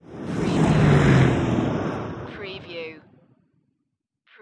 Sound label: Auto Aston Martin; sound effects bible